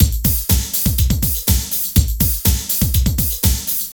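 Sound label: drum loops, right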